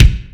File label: KICK, M1-M3R; Greytsounds VOL-1